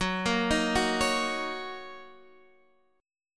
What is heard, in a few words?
F sharp 6th, down, Guitar, DADGAD